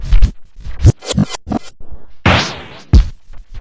133 bpm skratch

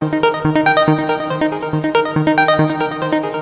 140 optimistic arp arpeggio; SYNTH AND ELECTRONIC LOOPS

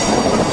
subway sound; UNUSUAL KITS and FX; Subway Sound Construction Kit

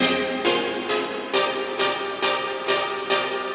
bouncy verby minor chords; melodic loops; strings orchestral classical loops

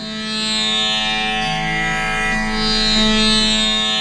Tamboura; Far East Drones and Plucks

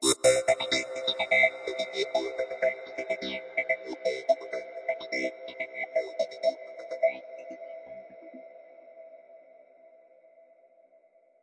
126 space banjo; SYNTH AND ELECTRONIC LOOPS

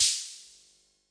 rhma hho, Whippany Rhythm Master; drum machines; 1970s

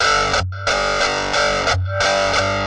guitar chunka; GUITAR LOOPS

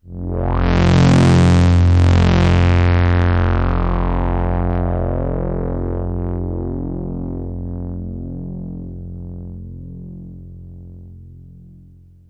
F1, Sweep Synth

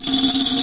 arabic perc, Arabic Percussion Kit

